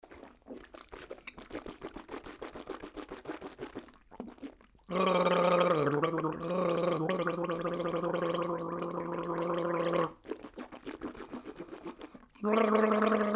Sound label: gargling with spitting